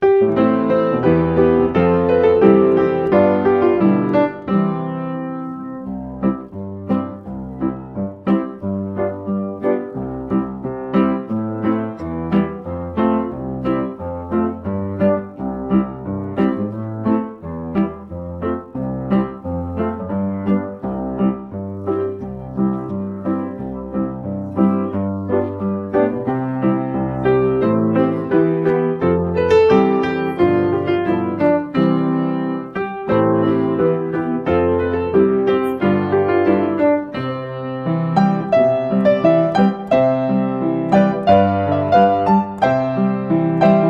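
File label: speech therapy vocal warm-up backing track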